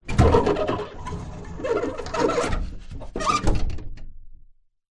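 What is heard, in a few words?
field closet door open close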